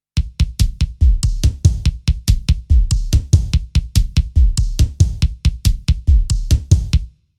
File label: Groove Kit